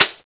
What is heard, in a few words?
reload, weapons; vulcanus1